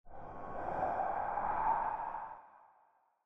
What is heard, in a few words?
melee attack swoosh magical effect necrotic, weapon swooshes; psfx